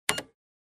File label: Pressing button beep